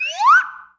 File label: Slidewhistle Up